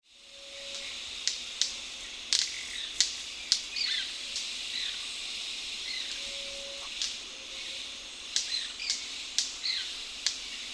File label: White-bearded Manakin